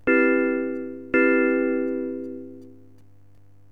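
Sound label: major 7th, synth chime; cubase; CUBASIS; SYNCHIME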